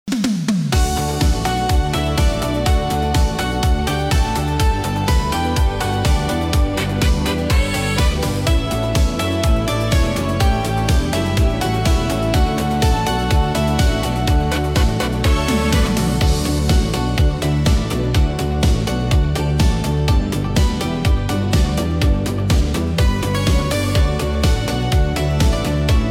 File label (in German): Etwas gemütlicher Discosound; sehr rhythmisch